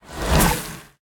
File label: activate; Smite; Vulcan